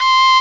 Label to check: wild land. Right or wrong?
Wrong